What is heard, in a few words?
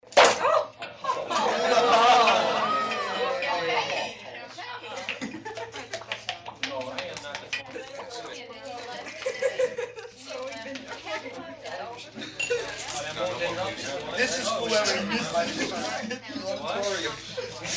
Party Crowd Cork Pop